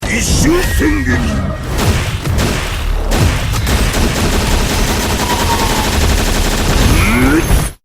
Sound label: raging demon; evil manfred